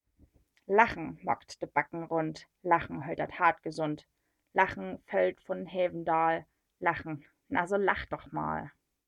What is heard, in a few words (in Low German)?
Lachen; Gedichten un Aftellriemels; Plattdüütsch